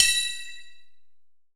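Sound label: FX open triangle; AKAI S6000 CD-ROM - Volume 5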